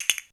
CASTA ROLL, percussion; drum

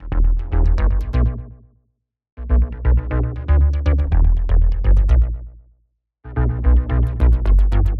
120 Em Reflux, Bass Loops; Touch Loops; BlissedOutBeats

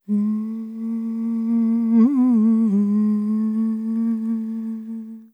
ETHNO FEMALE, Vocals; ethno2

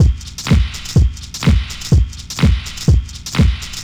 DEEPHOUSE BEATS 125

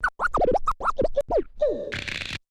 OLDSCHOOL SCRATCH 96

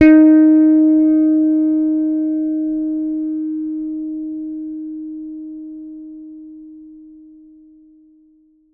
T-BIRD BASS PLUCK D#4; Bass Mace; DirectWave

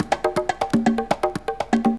BONG MACH 121, PERCUS LOOPS

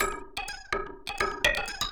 PERC LOOP INDST 125, Industrial Loop

